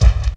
Bassdrums, Roland MC-303; Jngle bd; Drum Samples

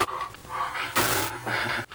Breathing Loop; Sound FX

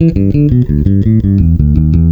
Triplets; Bass Drum - Kicks; GroovMaster

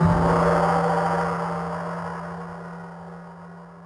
CYMBAL, Yamaha RM 50

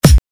kick

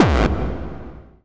Bombo Hardcore, kicks

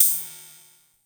Cymbal, Korg KR-55; Drum Samples

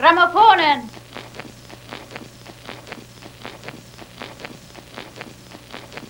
Gramaphonen; Sound FX

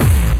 Hard Kick

